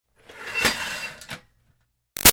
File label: Ironing Board Metal Fold Out Slow Scrape; Miscellaneous Household